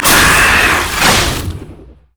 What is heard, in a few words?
spider vortex; LoL